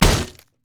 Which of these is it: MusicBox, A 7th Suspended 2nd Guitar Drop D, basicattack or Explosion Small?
basicattack